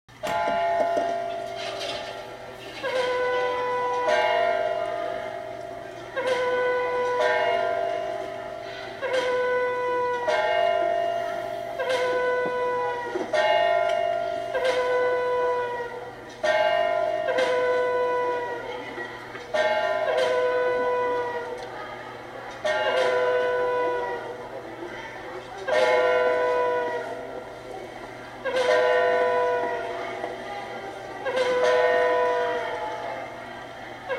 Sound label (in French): Chant du coq; Marburg